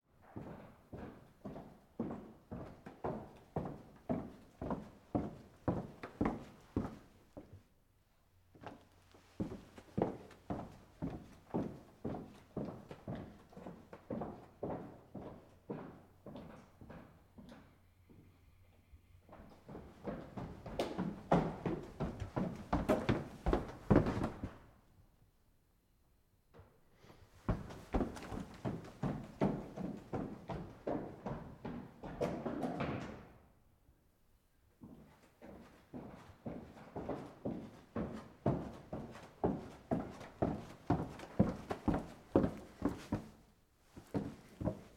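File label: Footsteps Approaching Over Wooden Floor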